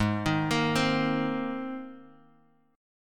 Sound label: G sharp Major Add 9th G sharp, Bass Standard; G#add9 arpeggiated; JGuitar